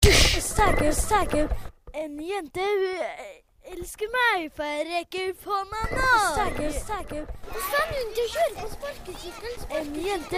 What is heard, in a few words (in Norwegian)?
Vokalstykke